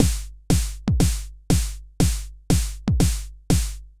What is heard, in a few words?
Snare